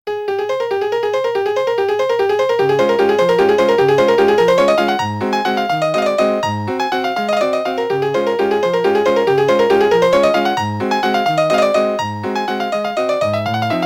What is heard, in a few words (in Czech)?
melodie